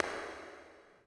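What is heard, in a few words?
echo run, footsteps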